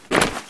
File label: suit step, Footsteps